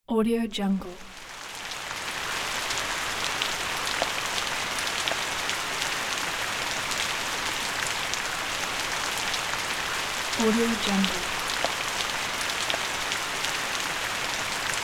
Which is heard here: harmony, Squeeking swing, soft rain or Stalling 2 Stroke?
soft rain